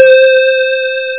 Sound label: Loop Bell; MarcDrive